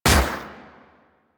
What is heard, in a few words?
Shot, Akimbo Pistol; Grineer; Prisma Twin Gremlins